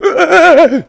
oooooh

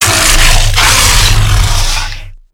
baron roar, baron of hell; doom4

